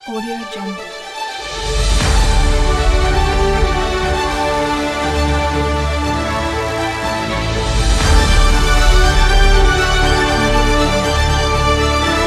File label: the awards opening logo